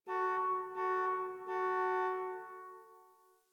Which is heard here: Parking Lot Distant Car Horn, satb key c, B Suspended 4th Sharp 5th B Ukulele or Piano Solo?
Parking Lot Distant Car Horn